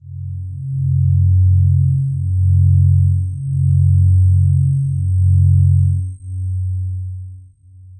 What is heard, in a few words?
Eighth-Tone-Drone, Experimental